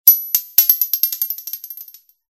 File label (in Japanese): 薬莢 モノラル